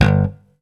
POP G1, Bass Electric

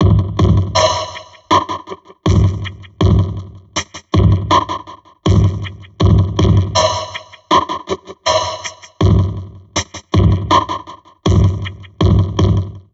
Drum Loops Crazy Processed; USB Soundscan vol.07; AKAI

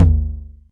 Tom Lo, Novation Drumstation; DrumstationV1

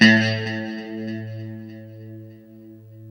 LO E A 2, Guitars; Spectrasonics; Hans Zimmer Guitars VOL-1; JC-SHEEN

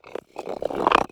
glitch foley

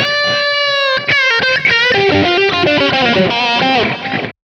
guit lead; Sonic Foundry (Sony Creative Software); Ugly Remnants VOL-2; Leads

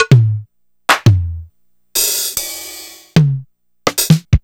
drumulator, Samples Sliced

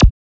FX ZAPT, Drumscapes Roland

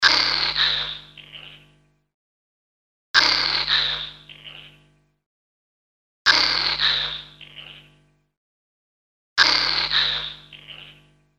Lick On Spit, Sound FX; Industrial

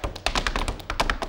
STEP + CLAPS, MSTEPS+CLAPS

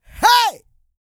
GRUNT, MALE HUMAN; ILIO; Vocal Planet

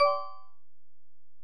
Bell, Alesis DM-PRO

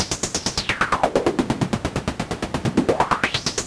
Modular 130BPM

